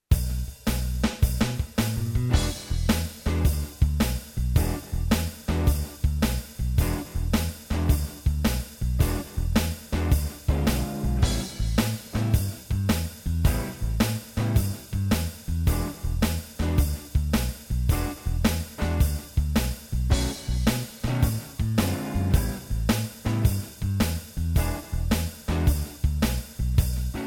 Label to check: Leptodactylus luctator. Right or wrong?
Wrong